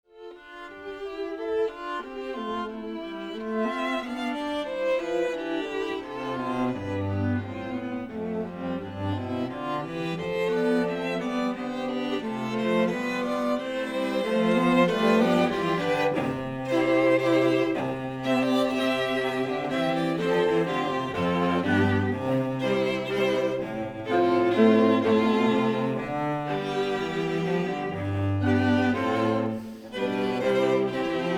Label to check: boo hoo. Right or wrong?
Wrong